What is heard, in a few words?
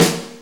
Snare C, Snare Drum; Sonic Foundry (Sony Creative Software); Loops & Samples Drum Tools